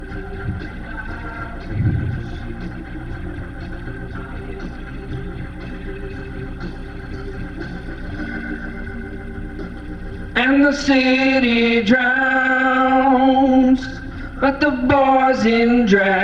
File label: VOX Leslie